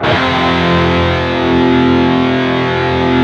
PRS, Guitars, PWR SLID

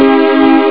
st chord; Amiga Soundtracker Sample Packs

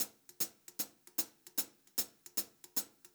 Brushed Drum Loops

